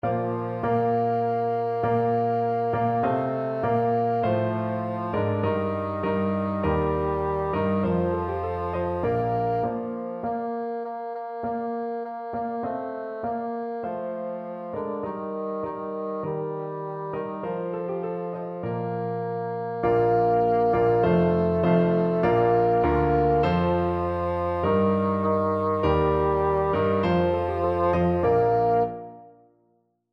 boars head, bassoon

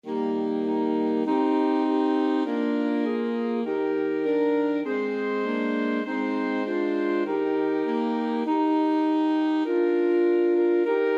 holy holy holy, alto sax quartet

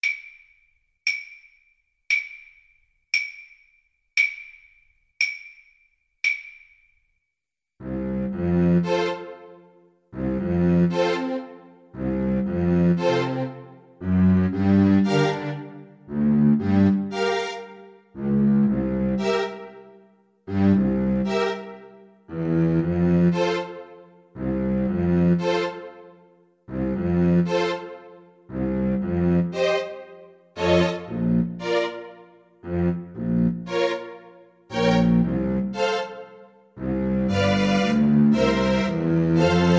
por, Trombone

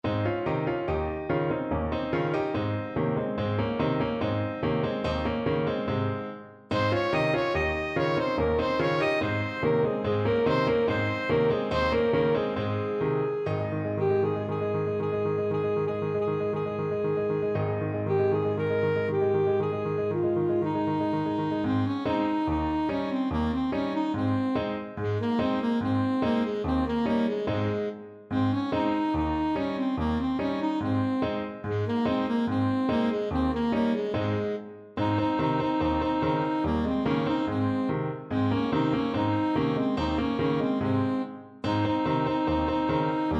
saxophone

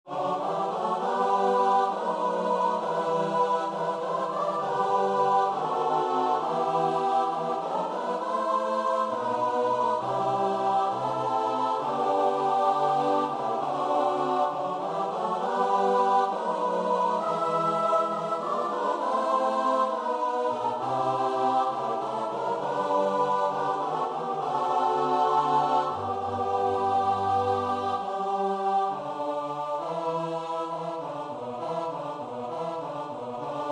lift every voice, choir

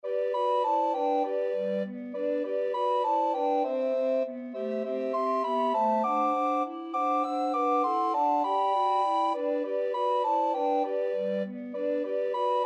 jingle bells, recorder quartet

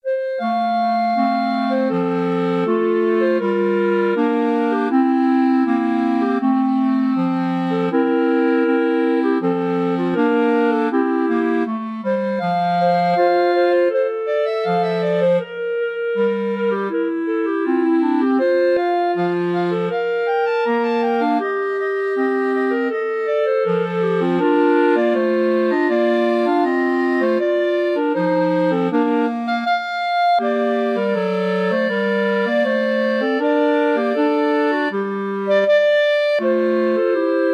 clarinet trio

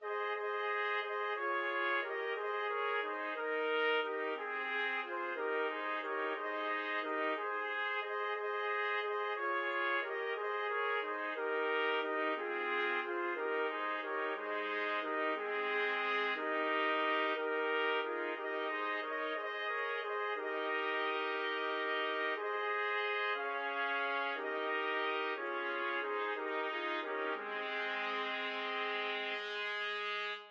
trumpet quartet

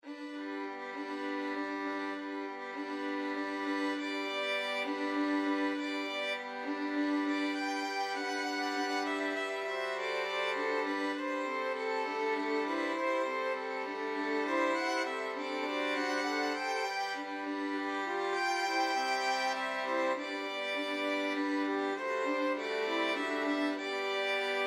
violin ensemble

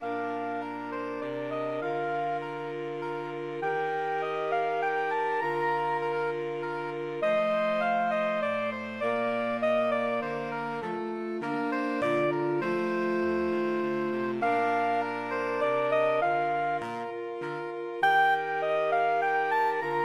minuet SAX quartet; bach

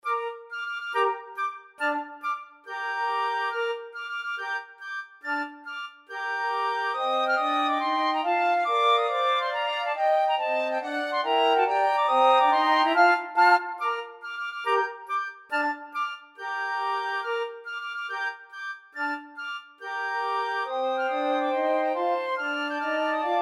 flute choir

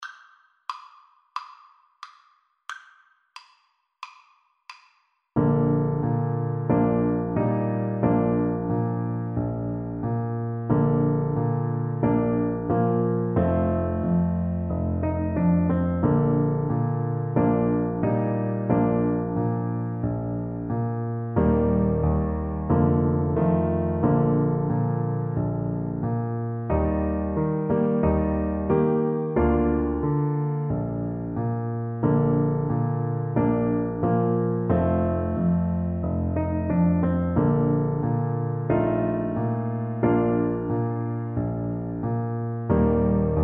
horn, French Horn

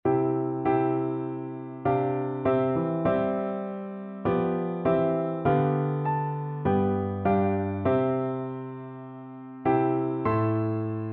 it is well, piano duet

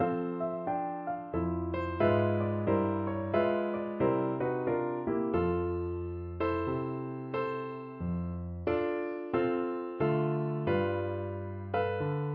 flute

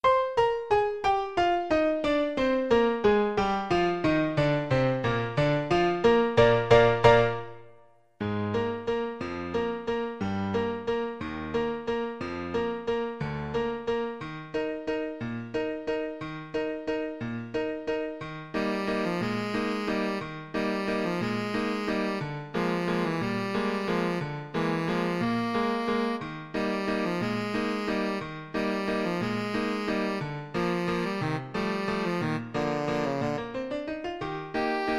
tenor saxophone

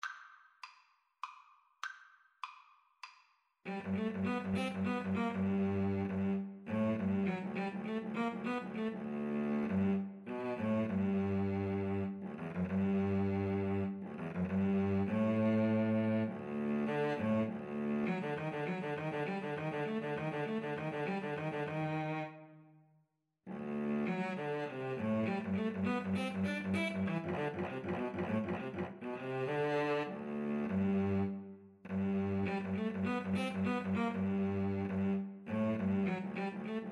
paganini minuetto, Violin-Cello Duet

